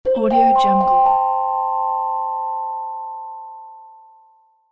app notification

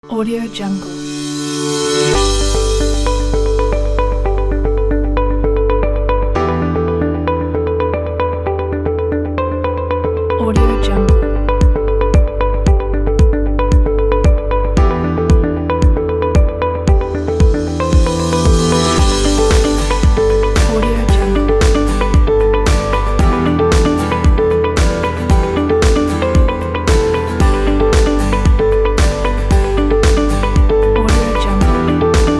corporate song

